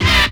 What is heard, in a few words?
Vinyl; Sound FX